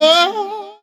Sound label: "oh no", SUNG; Voice